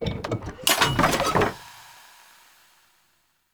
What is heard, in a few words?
Animal Rescue Cage Open; Encounters